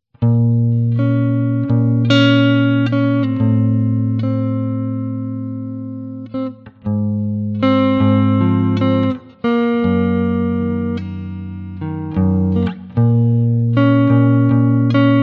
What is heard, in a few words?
Songs For Guitar